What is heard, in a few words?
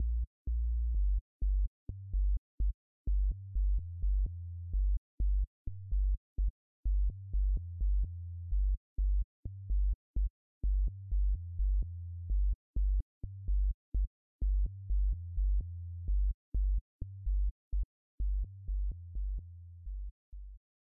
Bass Sub